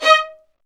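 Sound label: Violins Marcato; Roland LCDP13 String Sections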